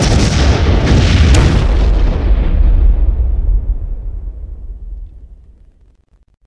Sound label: cargo bay damage, ships